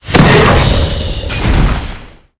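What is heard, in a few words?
angel warrior spawn; lava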